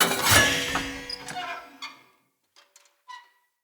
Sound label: Light Mirror Hit Move F; Puzzles